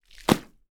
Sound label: Water; TOOLKIT vol.2